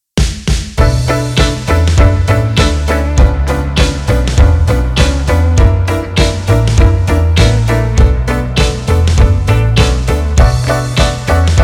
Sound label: electro light blues